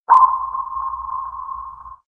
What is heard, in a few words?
Submarine